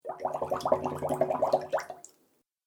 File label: Water Bubble Sounds; Water Sound Effects; Agcnf Media